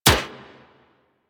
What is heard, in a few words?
Rail Jack Hit Notification; Enemy Ship